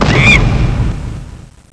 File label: Die Bomb; Monkey Shines 1.2.1